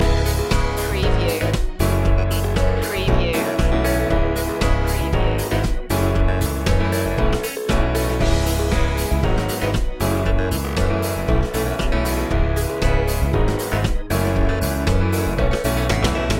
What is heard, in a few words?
fill the floor loop